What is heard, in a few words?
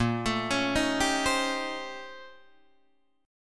A sharp Minor Major 13th, A sharp, down, Guitar, DADGAD